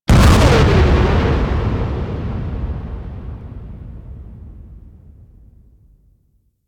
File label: Capital Ship Destroy Explosion; Warframe; Vehicles; RailJack Enemy Ships; Corpus